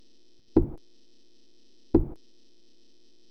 Slow Footsteps

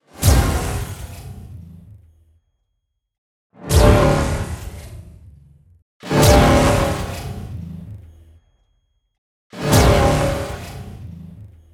Energy Burst

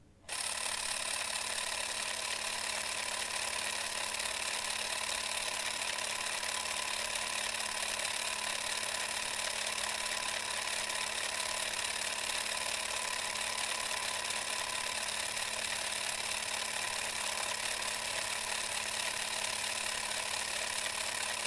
Super8 Front

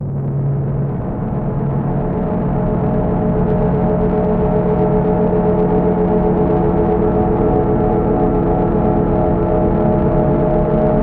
Dramatic Horror Sfx

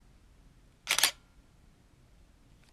Camera Click

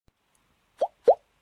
Double Drop

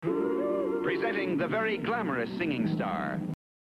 Old Timey Man Singer Introduction

